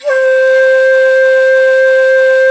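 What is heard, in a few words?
U220 shakuhachi; Greytsounds; Scott Peers Sound Engineering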